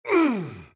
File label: AHurt; Black Adam